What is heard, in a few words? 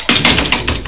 GATE